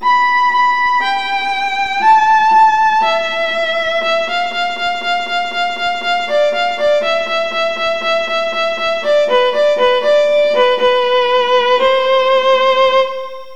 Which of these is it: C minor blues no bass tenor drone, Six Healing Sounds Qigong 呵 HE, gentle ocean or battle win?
battle win